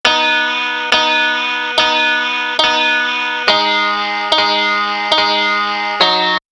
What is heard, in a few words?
piano